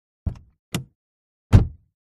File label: Car Door Int OC Solid